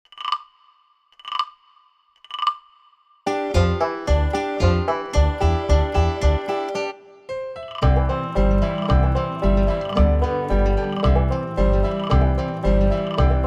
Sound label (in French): (Instrumental)